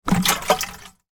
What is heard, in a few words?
household watering can plastic water movement inside; sound effects one